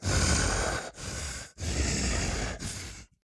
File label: Walk Cycle Burns; The Town